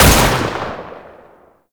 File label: Pistol Shot; Temple Signature; Warframe; Tenno